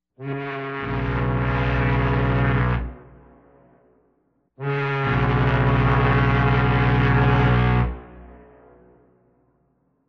gudok laynera

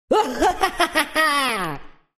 zloy kloun

